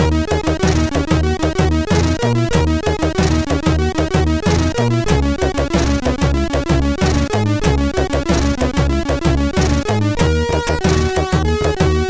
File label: Factory Noises, MOS6581R4